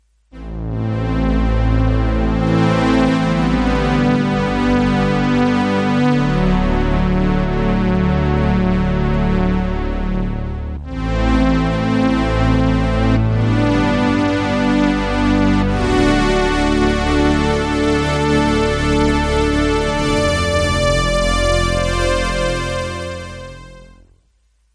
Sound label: Korg DW 8000